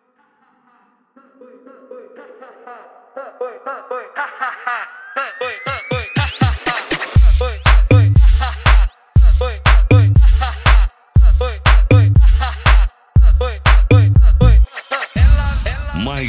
low bass; classic gold astra; corsa polentao

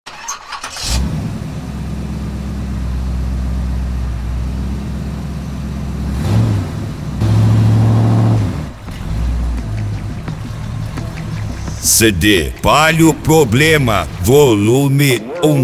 eletro funk

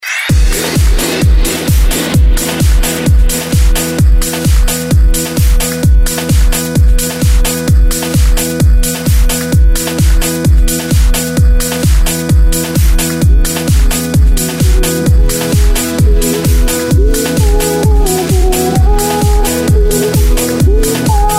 gospel dance

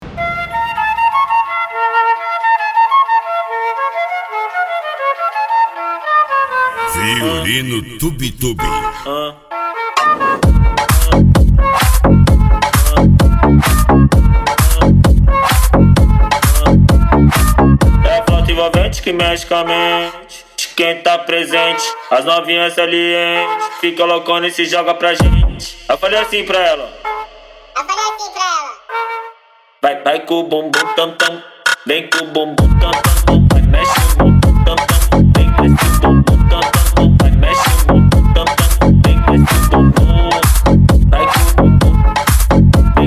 retro

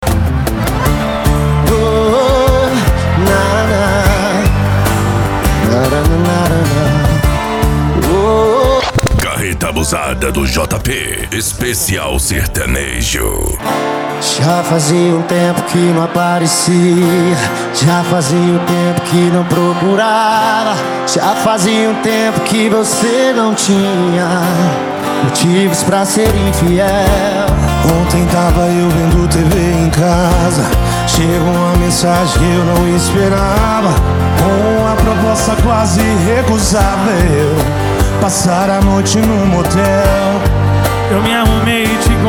sertanejo

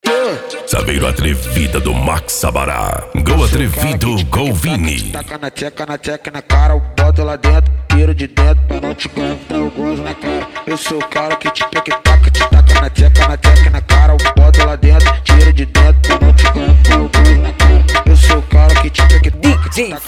funk mega bass

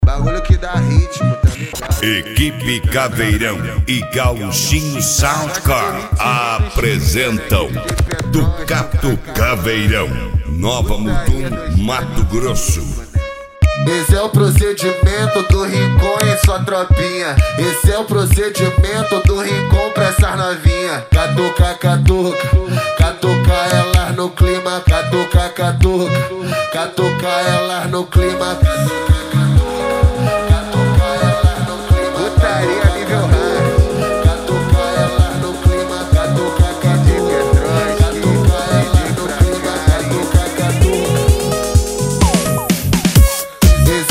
especial mega funk

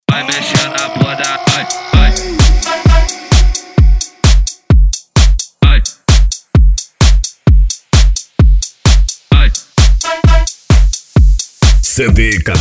electro funk

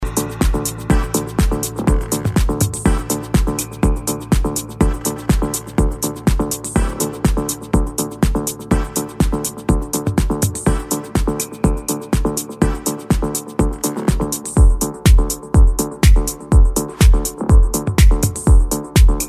progressive melodic house

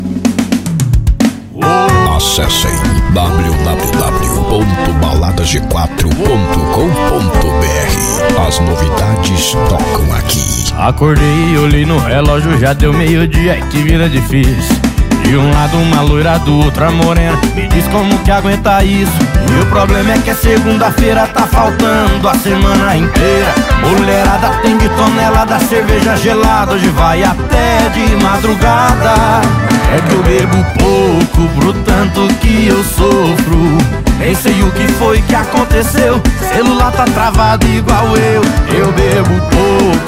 sertanejo; balada g4

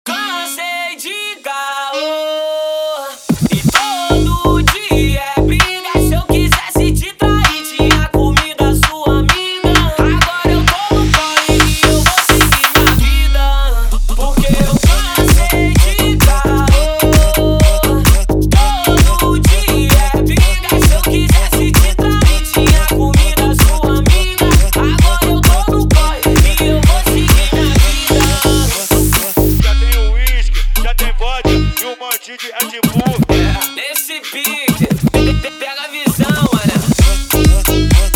funk in beat

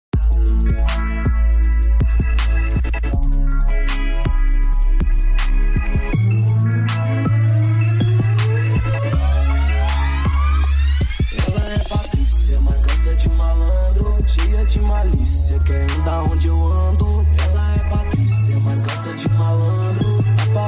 trap funk

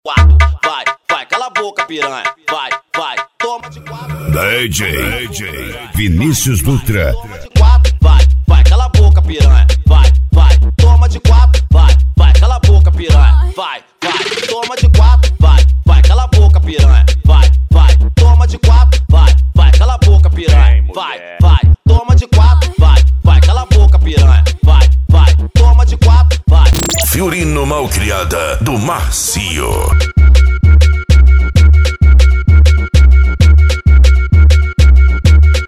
mega funk bass

